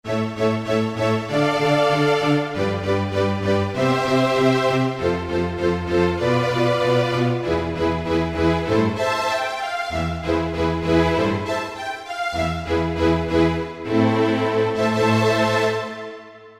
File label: Allegro, Yamaha PSR-3000 strings; Factory Voices